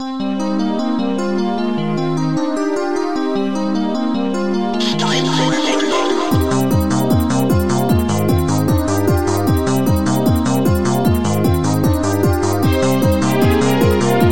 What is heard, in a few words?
TECHNO XM